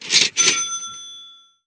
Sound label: Cha Ching; Etsy